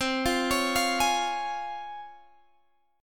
Db Major C; Db/C; arpeggiated

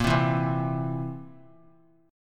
A sharp Suspended 2nd Flat 5th A sharp; strummed; A#sus2b5; JGuitar

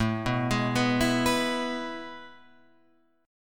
A Major 7th Suspended 2nd, Guitar, Open F; AM7sus2 arpeggiated; JGuitar

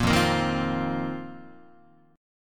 F 7th Suspended 4th F down Guitar; strummed; JGuitar

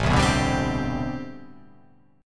A Minor 11th A, Chord

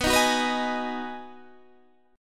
B 6th B, guitar chord

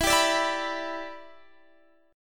E Minor 7th

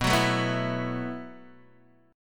B 7th Suspended 4th B down Guitar DADGAD

